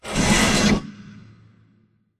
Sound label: pod door ext verb open slide; Titanfall 2